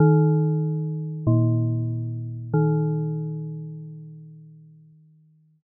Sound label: church bell